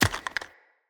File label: Acorn Drop